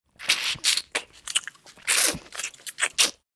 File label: Vampire sucking blood feeding